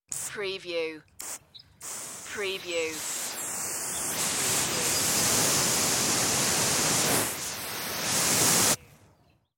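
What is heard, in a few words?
Garden Hose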